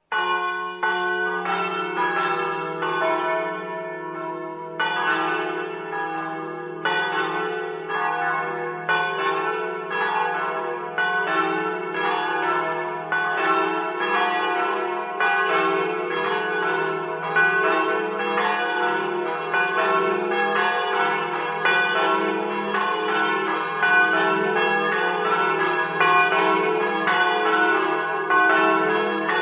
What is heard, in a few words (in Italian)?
coderno, campane